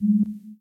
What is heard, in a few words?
General UI press; Rabcat; Classic 243